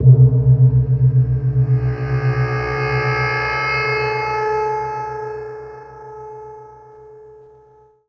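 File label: GLONG BACK, percussion metallic; Rarefaction; Poke in The Ear With A Sharp Stick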